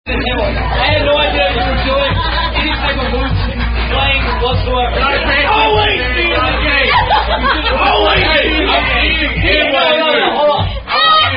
Drinking Games, Moose Drinking Game

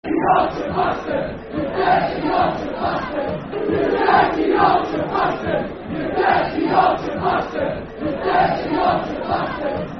Football Chant; Dirty Yorkshire; Darlington Dfc

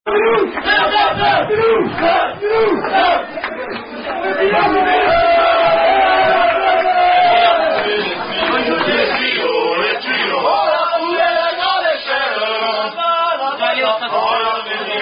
French Rugby Songs; Car En Chansons

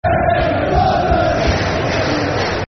Football Chants (Soccer Songs), Cardiff City

